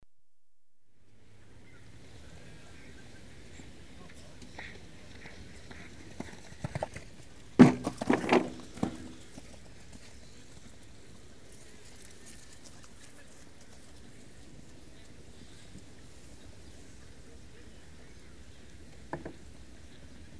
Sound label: Show Jumping - horse jumps and knocks fence down; Equestrian Events; BBC Sound Effects Library